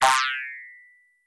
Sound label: lemming exit; dos lem